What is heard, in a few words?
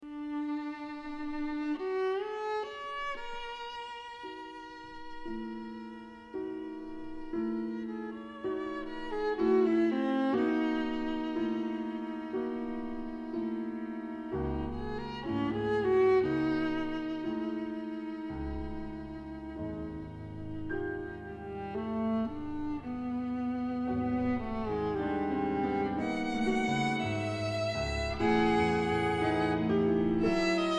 meditation for viola and piano; parik